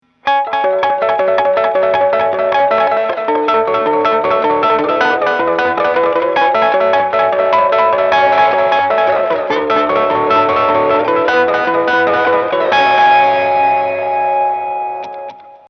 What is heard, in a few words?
5ths With Effects